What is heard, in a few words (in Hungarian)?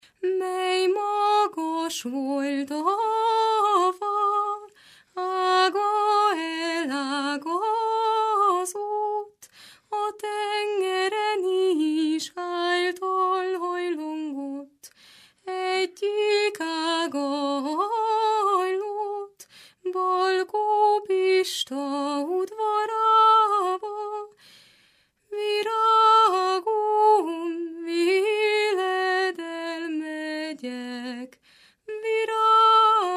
nepdal; mely magas volt